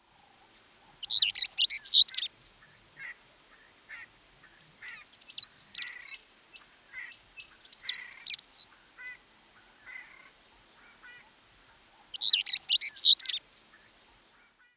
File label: Pintailed Whydah; eBird